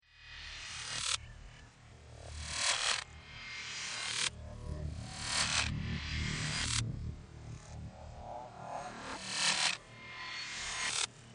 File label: UFO Roomtone loop, Subtle Wobbling Synthesized Roomtone Loop; Spacecrafts